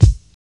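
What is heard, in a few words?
bass drum, Casio Rapman-II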